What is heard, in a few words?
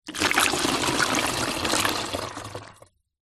household water pour into plastic funnel